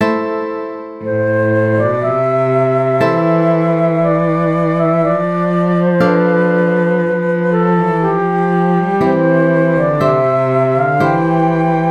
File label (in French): sonate la mineur